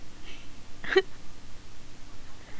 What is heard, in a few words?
monolaugh